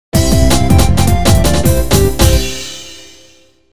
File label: success; easyrpg; WitchsHeart